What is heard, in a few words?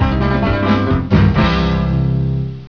Mark BGM Tail; EightBallDeluxe DEMO